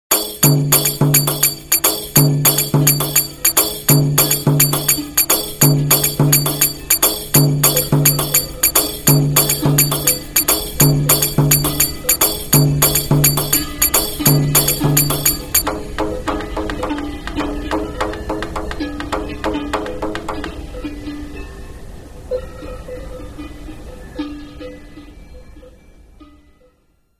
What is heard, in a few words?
rain dance